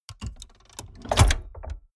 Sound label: mechanics medium snap; industrie wirtschaft; mechanik